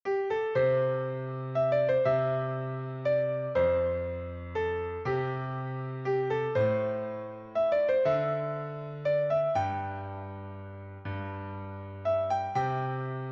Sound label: Amazing Grace, gospel piano